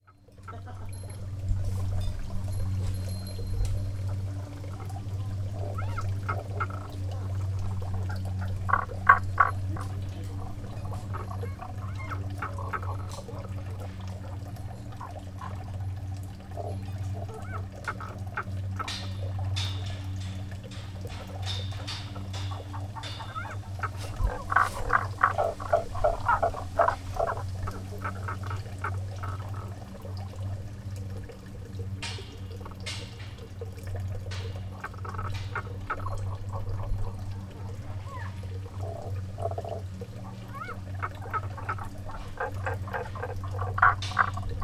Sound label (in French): grenouilles; grenouilles biwa